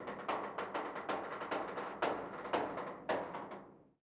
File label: ambient; World of Tanks